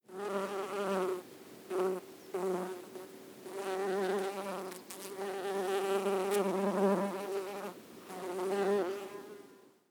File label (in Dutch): bij